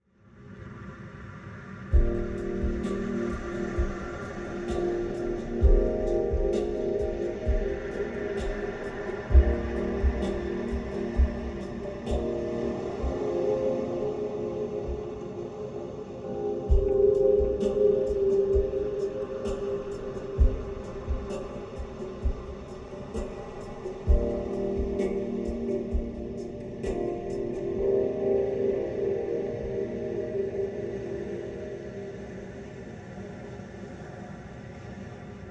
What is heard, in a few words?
Deep Sleep Relax Music 3D Sound